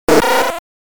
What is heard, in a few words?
Dragon's Flame (SFX)